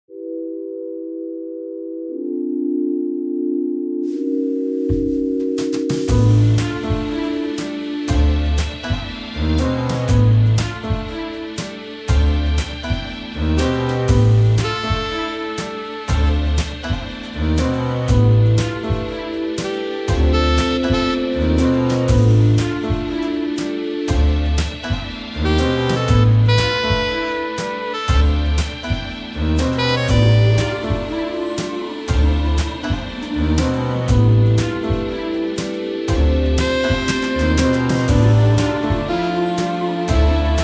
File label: Middle East Blues